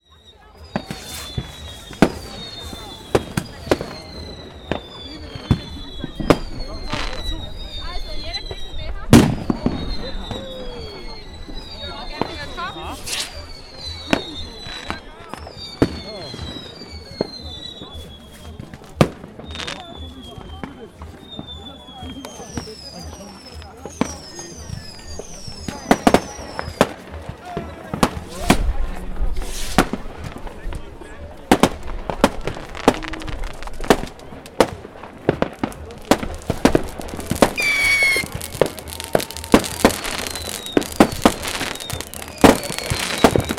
silvester harbour

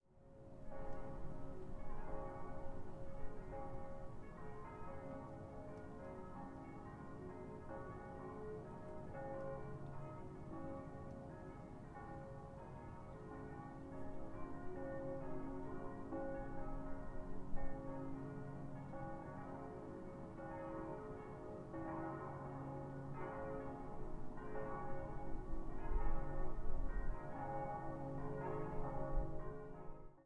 church bells

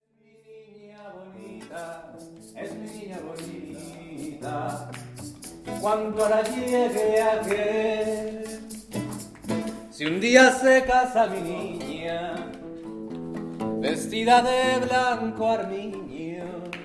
latin social night; waldemarhof

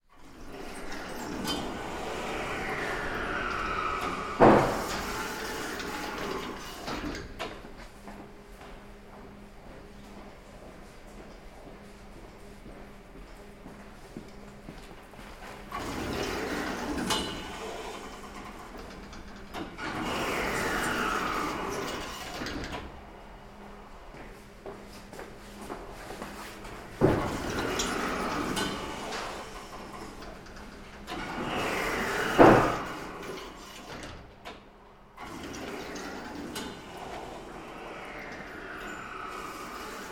electric door; informatikzentrum

